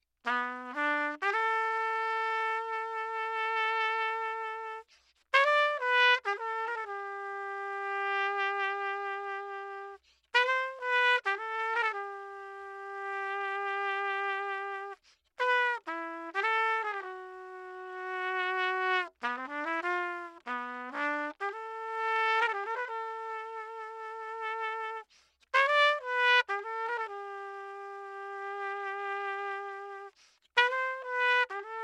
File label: trumpet; lewitt